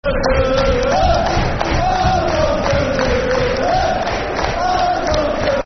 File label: Football Chant (Soccer Song) Leeds; Alan Thompson; fanchants; Leeds Lufc